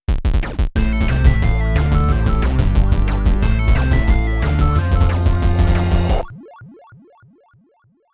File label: Jingle; Play14